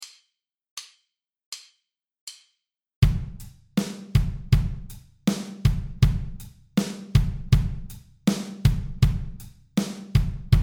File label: Beat 80 BPM, drums